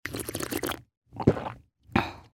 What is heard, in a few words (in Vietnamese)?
Tieng nguoi uong nuoc oc oc